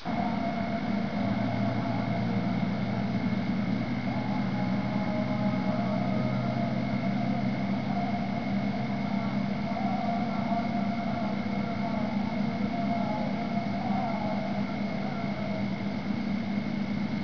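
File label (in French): ambiance nuit casa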